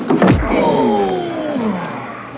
hrdpunch; DopeWars

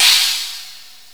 Chinese Cymbal; Software Explosion 2 - Virtual Computer Pets; yamaha xg; drums

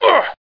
sec pain; TNP demo

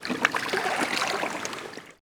battl water

